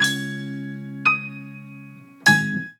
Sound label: Band - Hit one two three; Ed Edd n Eddy; Adromulus Pack; EEnE